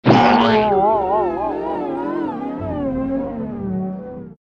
Boing

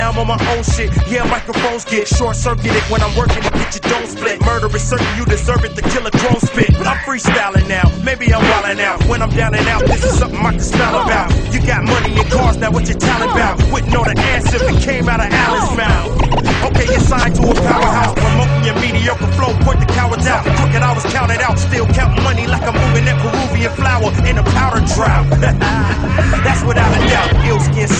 Beats

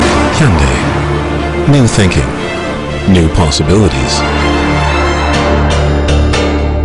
Hyundai Orgel

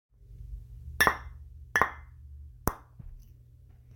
Pok pok clang

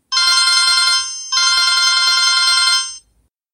Digital Ringtone

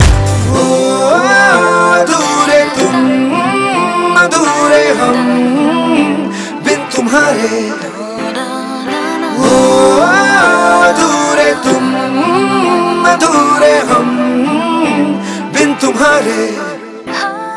Soft Tone

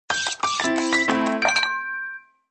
notification

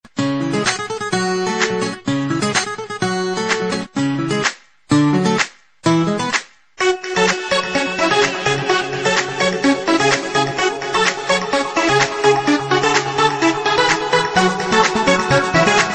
Dance Claps

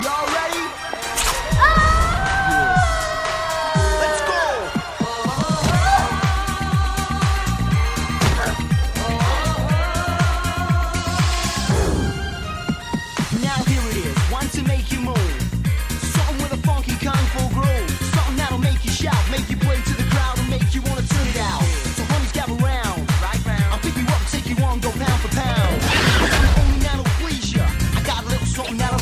Rock; Shaolin Soccer

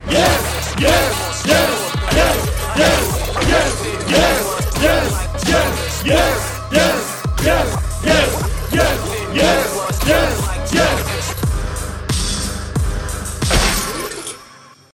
Beatdown Chant; Trial

